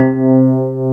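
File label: C4 electric piano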